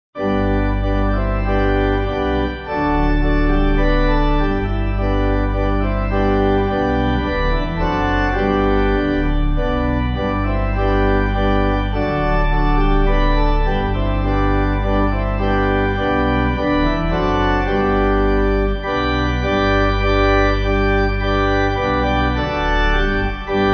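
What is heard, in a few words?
Pipe, Pipe Organ backing; Holy Manna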